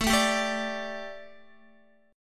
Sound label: A 7th Suspended 4th Guitar, chord